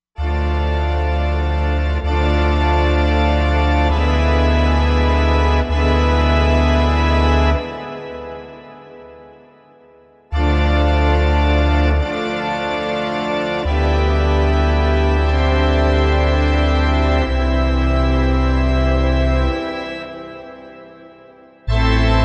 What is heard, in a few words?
Grand Pipe